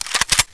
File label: famas forearm; czero opforce